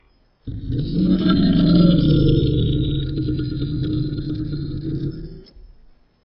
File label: Masiakasaurus, Dinosaur Roars